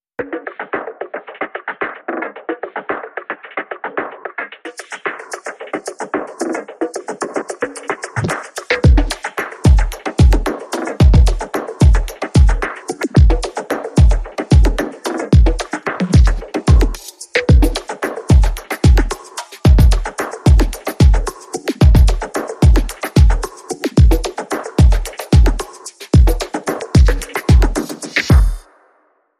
Beat Forward Funk

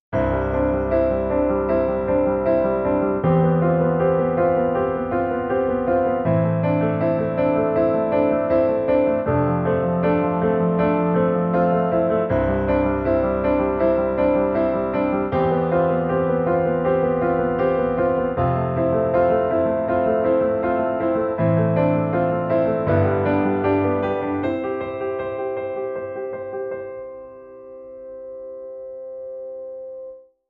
Piano

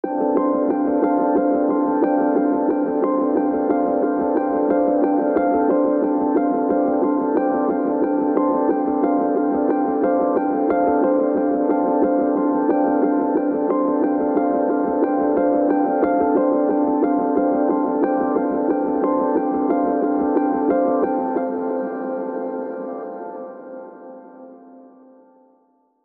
Bright Pulse

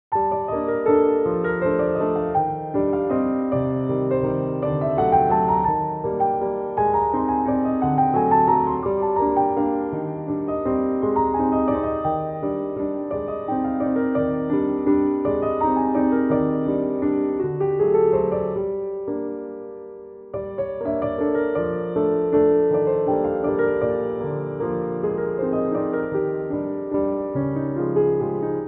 Valse Fantastique

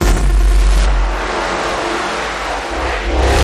glitch

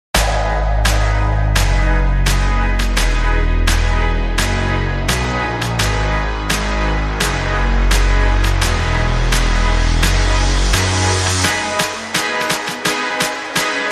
No Lead Synth

